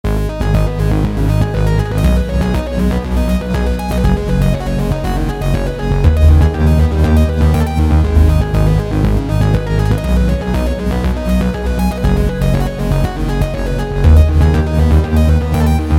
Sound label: techno